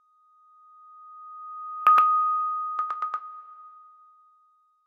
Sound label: N8 3d Surround Sms